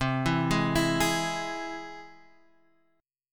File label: C Augmented down Guitar Half Step Down